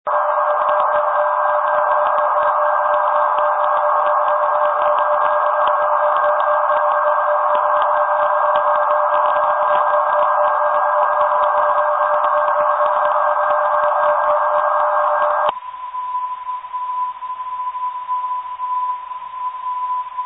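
322 kHz 400 24 8 Hz filter, non-directional beacon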